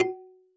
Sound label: marimba kalimba; ゆめ2っき